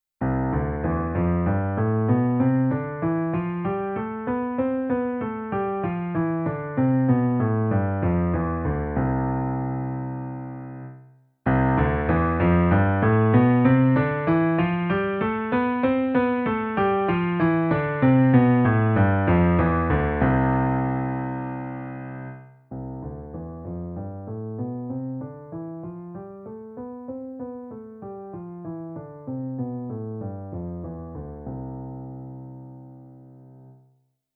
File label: C Scale 3 dynamic levels